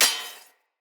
Short Sword Thrown Impact Armour

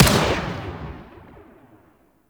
Burst Gun Fire; Warframe; Archwing

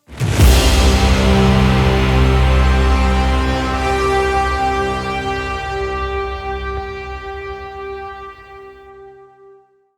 Race Music Ending; K Drive Races; Deimos

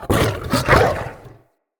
Wolf Attack Growl Double; Animals

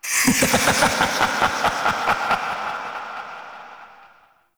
Dissolve; Warframe; Mods; Peculiar Mod

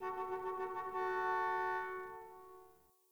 Parking Lot Distant Car Horn

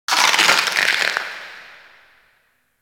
Ice Close Ice Break Small; Warframe; Corpus Ice Planet

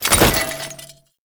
Cannister Throw Prep; Enemies; Abilities; Warframe; Tau; Dax Archer

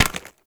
Bone Effigy Impact Hit; Gameplay; Warframe; Tau; Destructible Dynamic

